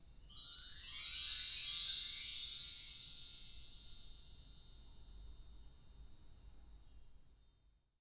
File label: Event Chimes